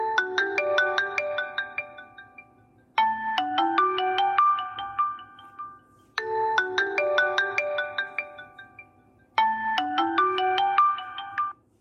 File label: Huawei Tune Clean Original Ringtone